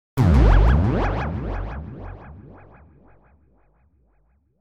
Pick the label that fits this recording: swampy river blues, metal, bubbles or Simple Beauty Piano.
bubbles